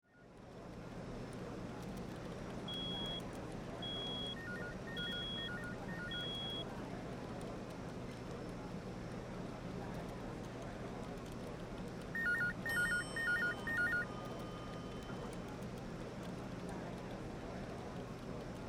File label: Ambience Office Walla Light Phone Rings Elevator Bell; Buildings